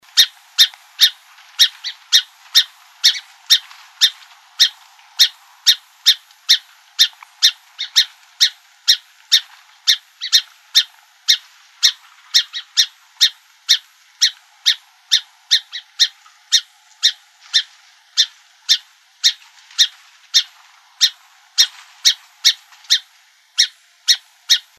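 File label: Song Sparrow CALLS, Emberizids; SOSP; deviche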